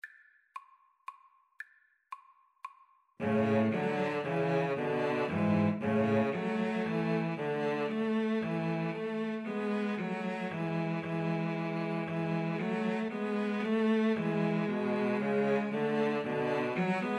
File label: string trio